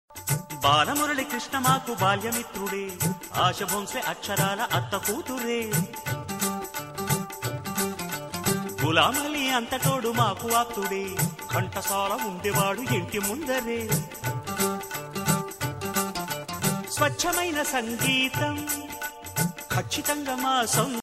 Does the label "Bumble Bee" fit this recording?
No